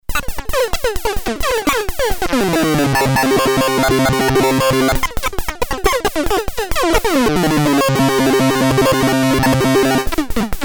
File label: robot, redsound darkstar xp2; Robot PWM